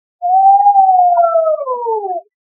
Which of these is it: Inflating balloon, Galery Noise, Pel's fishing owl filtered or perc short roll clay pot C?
Pel's fishing owl filtered